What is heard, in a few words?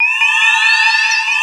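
ambient pad, synthesizer; AKAI S6000 CD-ROM - Volume 1; DIGI PAD FX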